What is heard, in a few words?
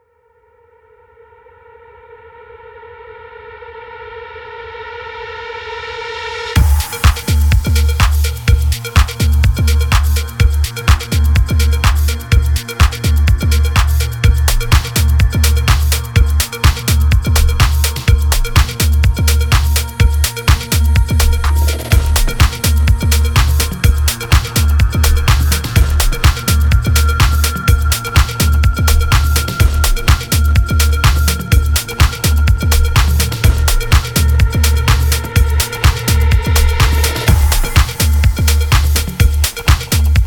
707 Vintage Drum Hits; Black Octopus Sound